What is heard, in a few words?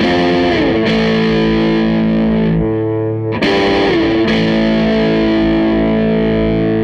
Les Guitar Loop